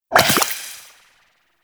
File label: Goo Gun Tail; Warframe; Grineer